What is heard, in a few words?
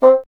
WND BSN, Bassoon